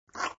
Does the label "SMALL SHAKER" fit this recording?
No